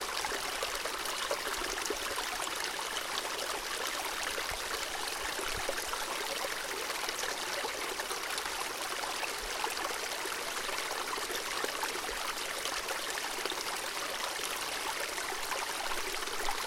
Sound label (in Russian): nebolshoj bezimyannij ruchej techet po lozhu skali v dolomitovih alpah